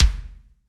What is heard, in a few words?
Yamaha Kick Hard